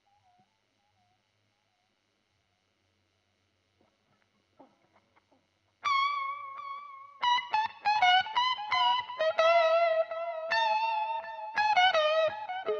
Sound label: GTR Royer